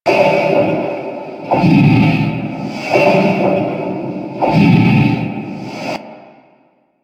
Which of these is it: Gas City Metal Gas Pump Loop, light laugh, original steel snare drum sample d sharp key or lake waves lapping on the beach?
Gas City Metal Gas Pump Loop